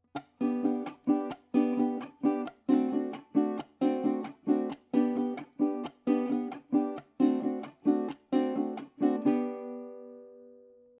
Merengue Sol Mayor

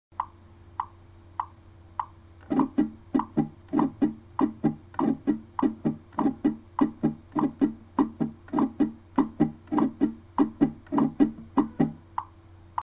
Ritmo Vals b100

